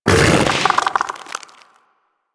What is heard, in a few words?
Bug Vocal Tranquillized Grunt; Warframe; Hunting Critters; Inf K Drive Bug